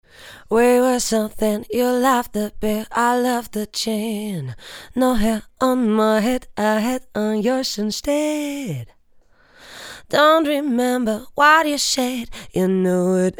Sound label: lct441 fem vox card